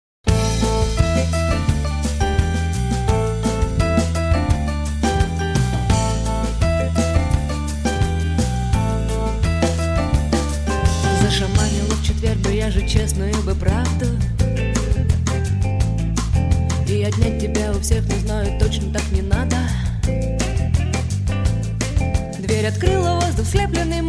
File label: rumba